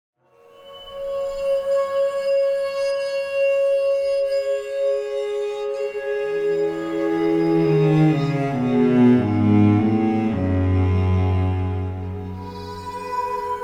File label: waterphone; Muzyka Ilustracyjna